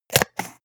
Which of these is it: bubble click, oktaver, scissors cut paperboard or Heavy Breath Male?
scissors cut paperboard